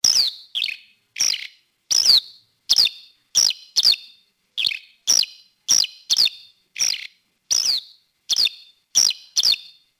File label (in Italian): allodola, birdsound